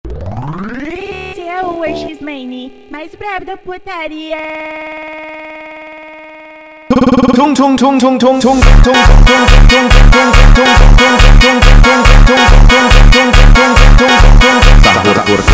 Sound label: Funk